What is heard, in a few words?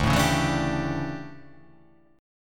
D Major 7th Suspended 2nd Suspended 4th D